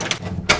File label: door open